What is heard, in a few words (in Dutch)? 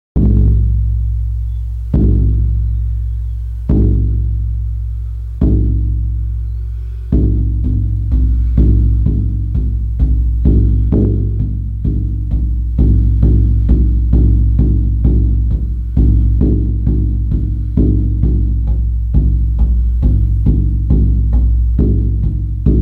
geit, sjamanendrums